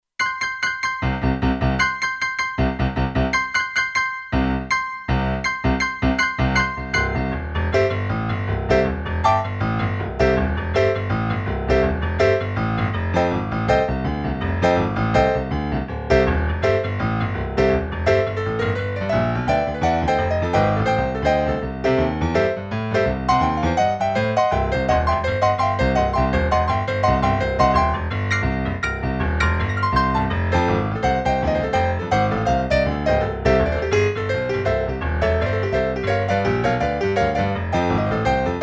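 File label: Rock Piano, Kurzweil K2500X; Factory Programs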